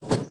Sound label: cart rail; minetest; boost cart